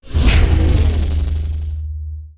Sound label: planet killer fire; apocalypse